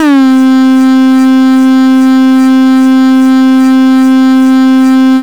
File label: Tear Out Bass, C3